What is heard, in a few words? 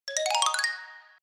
xylophone